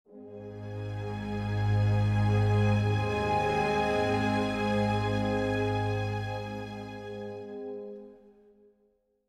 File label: Music Segments Walk Majestic 64bpm End; asterix obelix slap them all 2